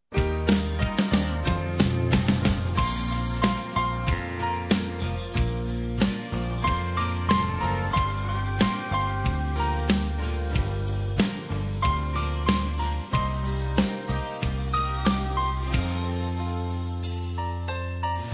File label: 3/4 Country Ballad